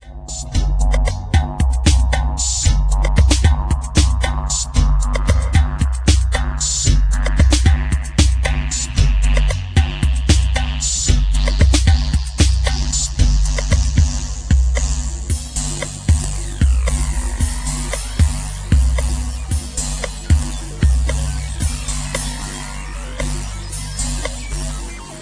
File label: confusiondream, ambient